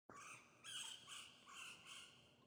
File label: sting wild bird; Rust